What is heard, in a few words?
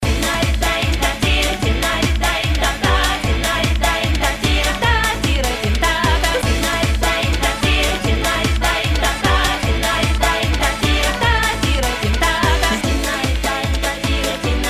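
folk background, Russian folk music; narodnaya fon; muzyka dlya prazdnika v dou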